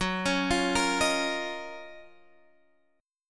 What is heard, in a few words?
Gb Major 13th, down, Guitar, Drop D